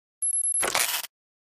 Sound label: Detonator, timers